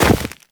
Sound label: Run Deep Snow, Footsteps; Warframe; Operator